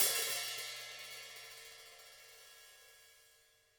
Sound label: room Op Hat, drum